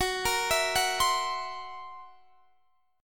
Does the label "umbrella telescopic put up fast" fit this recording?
No